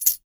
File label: JINGLER; Spectrasonics; Liquid Grooves 1 & 2